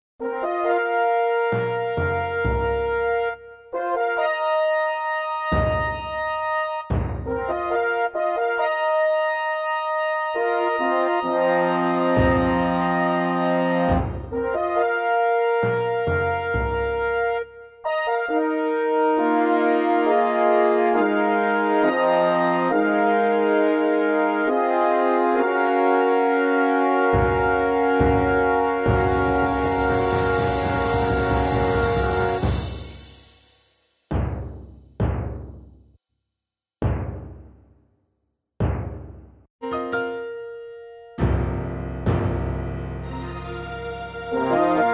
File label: fanfare